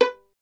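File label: STR violin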